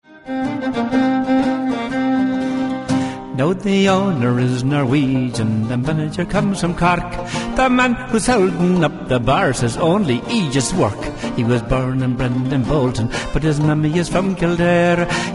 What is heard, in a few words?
pub